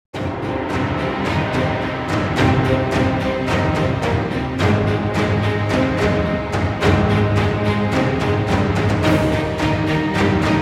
Dramatic Trailer Epic Music

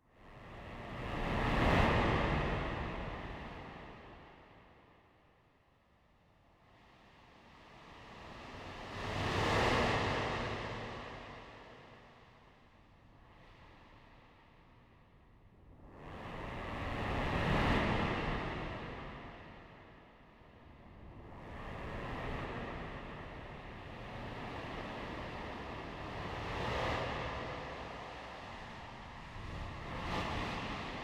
Yamaha SY99 SFX Ambient

